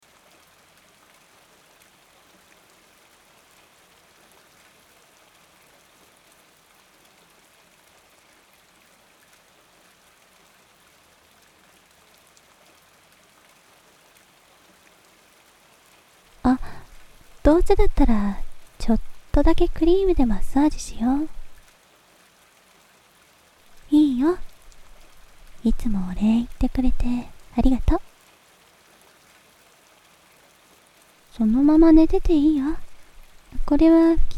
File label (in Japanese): クリームでお耳マッサージ